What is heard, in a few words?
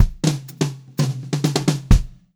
Pop-Rock Drumloops